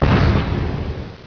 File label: Die; Virus: The Game